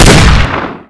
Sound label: barrel; svencoop